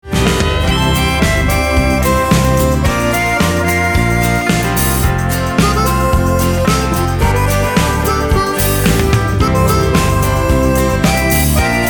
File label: Gold Cowboy, Country